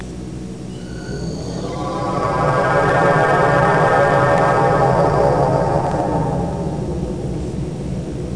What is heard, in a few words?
beam out